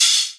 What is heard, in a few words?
Open Hihat; Vengeance Samples; VEE Cymbals